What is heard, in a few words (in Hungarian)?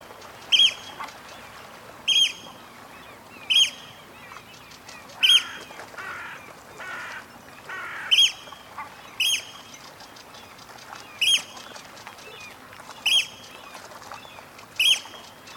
csigaforgato hangja